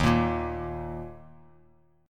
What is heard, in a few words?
E flat 5th E flat, down, Bass Standard; strummed